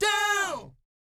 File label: DOW G#4, Vocal Jazz Fx; Spectrasonics; Vocal Planet Vol.3 Jazz Fx